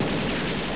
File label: machine